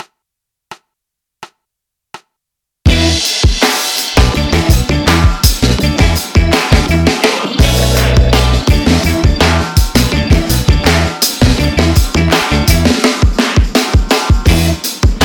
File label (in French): Basse Batterie 84 Bpm